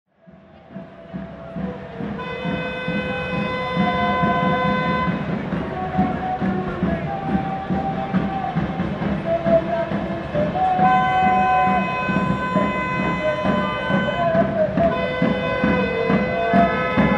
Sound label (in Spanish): manifestaciones aparicion con vida; j j lopez cordoba e italia 18.40 hs 11 oct 2006; Rosario